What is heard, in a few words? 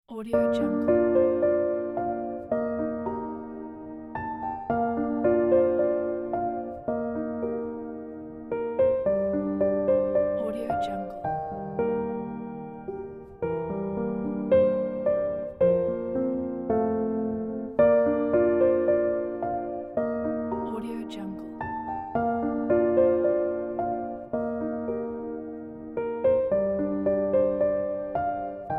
waterfall memories soundscape piano